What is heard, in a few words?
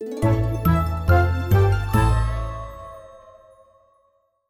You Win; ArmyRaid; Interface and Item Sounds